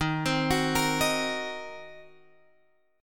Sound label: D sharp Minor 6th, Guitar, Drop D; 13,15,16,15,16,x; chordshape